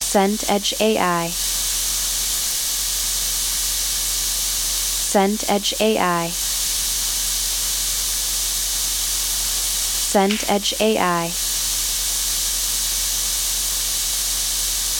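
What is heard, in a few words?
ambience library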